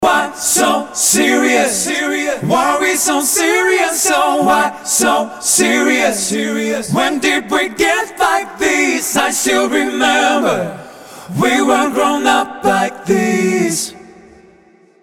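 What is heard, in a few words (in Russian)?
бек-вокал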